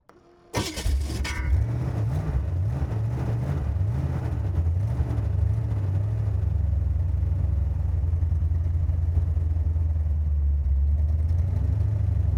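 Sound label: Drag Boat Onboard Start Drive Ramps Stop Off Engine Left; 1970s; MKH8020; Pole Position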